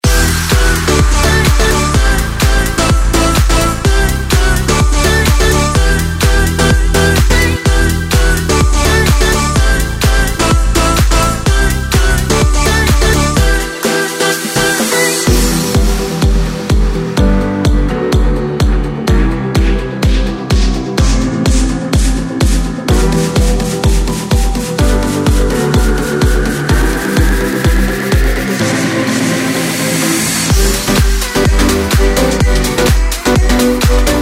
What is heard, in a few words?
SERIAL BEATZ